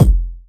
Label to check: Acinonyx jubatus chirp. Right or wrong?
Wrong